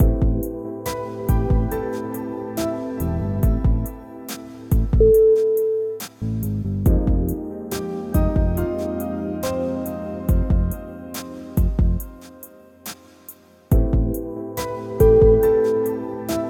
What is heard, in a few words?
smooth chill music